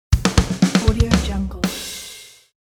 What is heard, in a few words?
drums fill